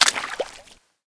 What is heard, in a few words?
marsh step; Golden Crayon Sounds